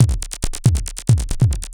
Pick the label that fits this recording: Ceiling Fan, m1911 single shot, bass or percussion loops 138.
percussion loops 138